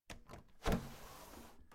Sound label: window open; Zomboid 41.78.16; Project Zomboid